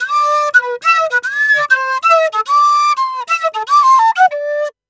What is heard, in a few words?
Penny 98 bpm G, Penny Whistle, African